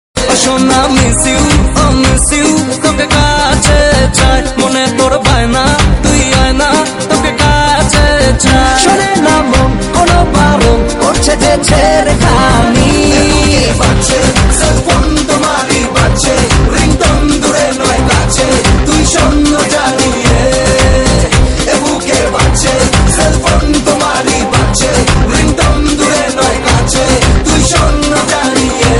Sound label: Bengali song ringtone